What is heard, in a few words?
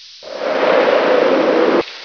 blizzard wind